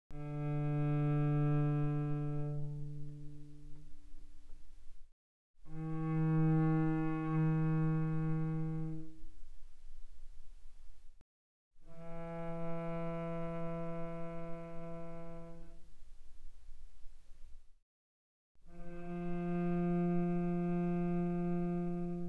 Cello arco pp sul D D3 Db4; mono; Strings

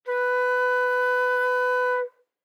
Flute nonvib ff B4 stereo; Woodwinds

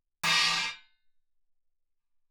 16 chinese, choke crash, suspended cymbals; Percussion

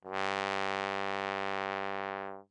Tenor Trombone ff G2; Brass

